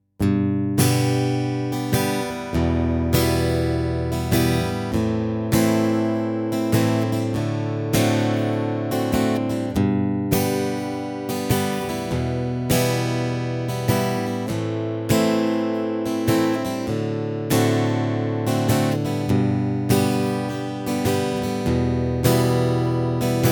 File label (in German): Anschlag, begleitung; Schlagmuster 2; Begleitgitarre